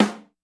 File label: snare, Acoustic